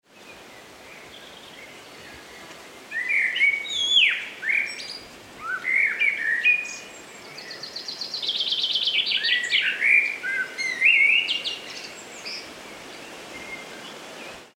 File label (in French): ambiance tumulus